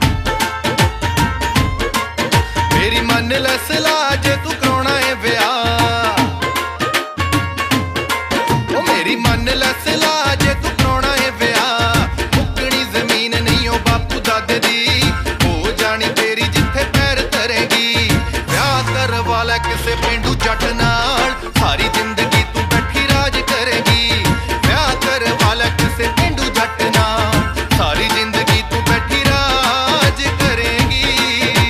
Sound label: Punjabi Hit Song Ringtone